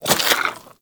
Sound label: Infested Throwing Star Reload; Warframe; Infested Throwing Stars